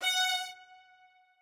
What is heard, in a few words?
strings